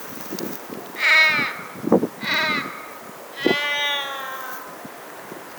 An audio recording of an Australian Raven (Corvus coronoides)